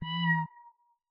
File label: Kitten, notifications; Samsung Galaxy S26 Ultra; Fun